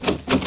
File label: DOORKNOB, HOUSEHOLD